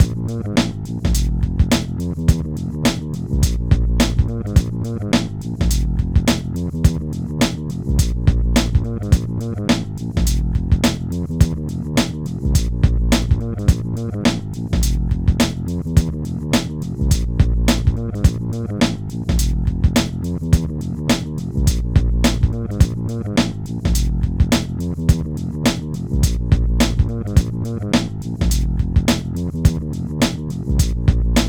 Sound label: Load Loop; NBA Street; GC; PS2